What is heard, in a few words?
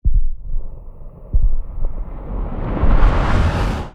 heart destruction riser